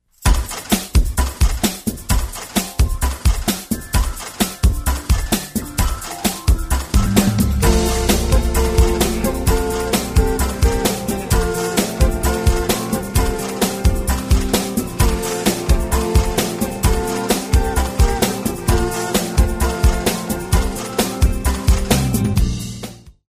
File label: Melody, RingTune